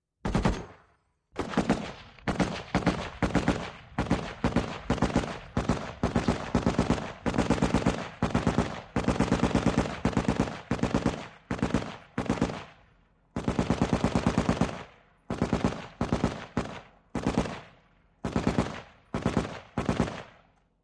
50 Caliber Machine Gun At Firing Range, Medium Perspective